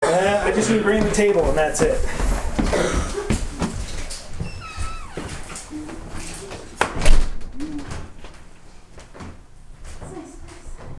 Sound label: Huming